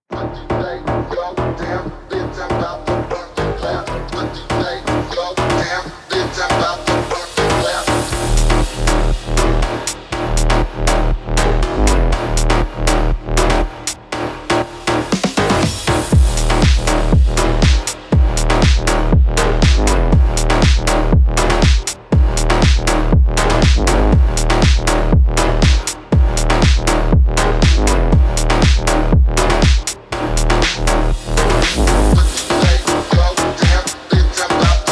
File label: shadow shuffle phonk